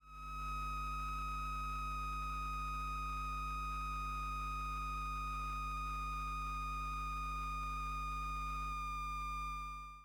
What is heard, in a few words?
Toy Synth Stylophone High Pitched Squeal Scream Feedback, Circuit Bent Toys; Submerged Tapes; Circuit Bent Toys Volume 1